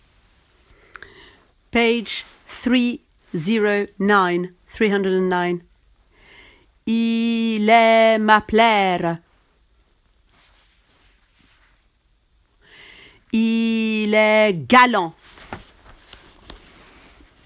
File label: tenor; Carmen